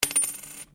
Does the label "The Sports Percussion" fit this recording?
No